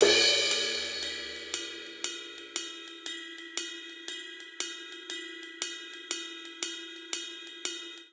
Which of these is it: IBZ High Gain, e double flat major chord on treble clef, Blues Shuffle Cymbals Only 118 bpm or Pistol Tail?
Blues Shuffle Cymbals Only 118 bpm